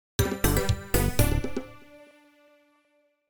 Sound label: Level Complete (Bonus Level); Gold Miner Joe; ios macos windows